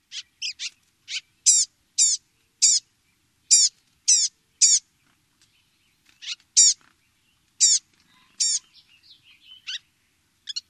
calls

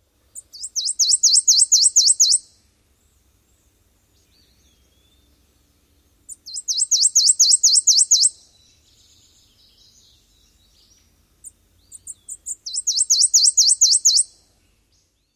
songs

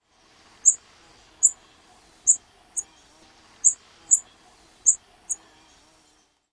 calls

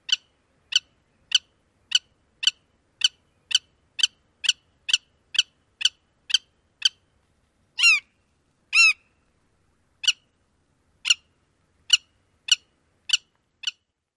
kip alarm calls

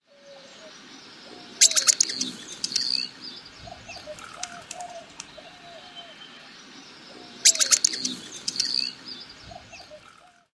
outburst of calls repeated twice

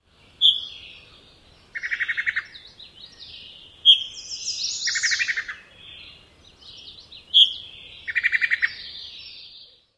eek calls amp b up volleys, WOOTHR

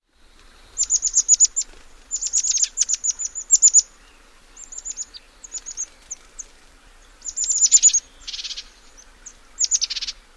calls

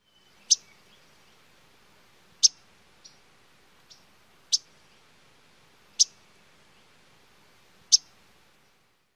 Audubon's chwit calls

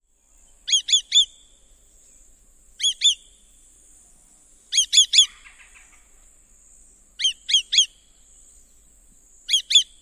kli-kli-kli alarm calls given in flight

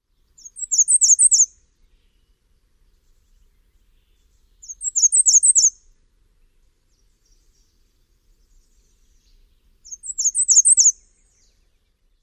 songs

